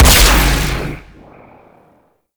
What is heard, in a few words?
Experiment Riffle Shot, Weapons; Warframe; Corpus; Sent Experimental Riffle